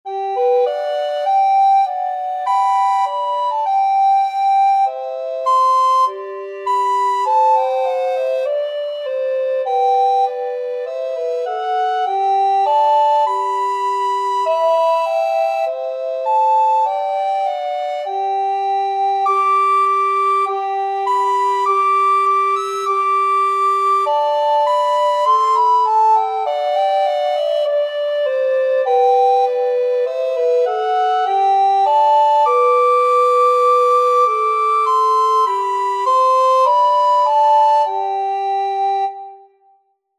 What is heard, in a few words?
recorder duet